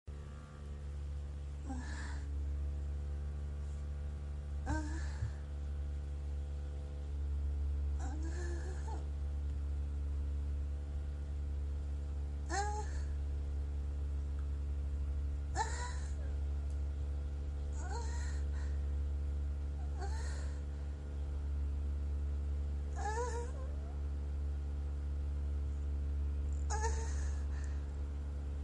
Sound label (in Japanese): 31歳 喘ぎ声